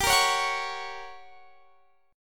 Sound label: G Minor Major 7th Flat 5th G, Guitar, Standard; strummed